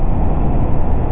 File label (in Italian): vento; train; quake2